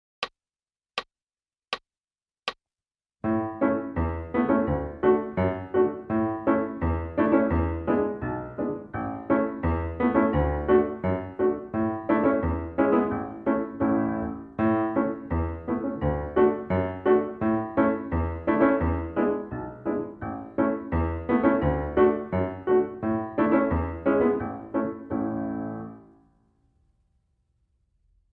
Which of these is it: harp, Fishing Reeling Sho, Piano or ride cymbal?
Piano